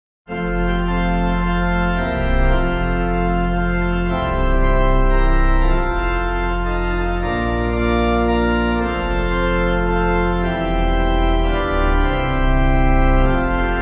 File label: Organ